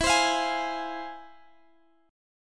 F Minor E; strummed

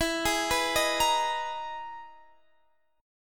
E Major Sharp 11th, Guitar, Drop D; chord shape

